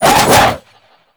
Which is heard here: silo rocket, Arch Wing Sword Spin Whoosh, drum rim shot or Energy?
Arch Wing Sword Spin Whoosh